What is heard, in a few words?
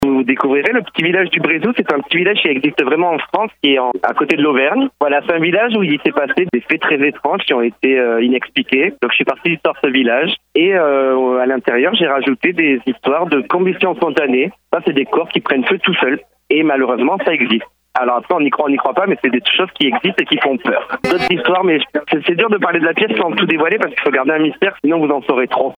AAAHHH